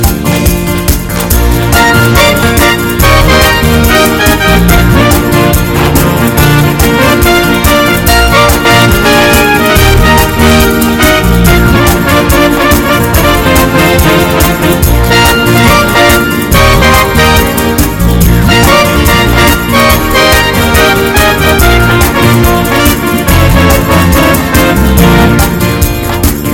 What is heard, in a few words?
A Quick Shuffle